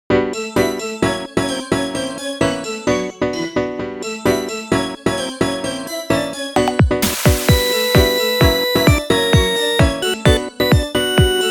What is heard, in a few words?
whispers cafe